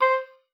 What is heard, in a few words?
Oboe, C5; Xsample VOL-6